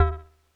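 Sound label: Drops Kawaii D#1; keys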